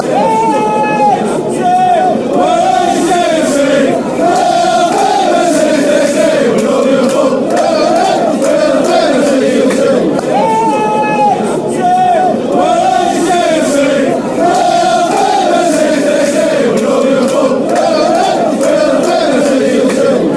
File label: chant; htfc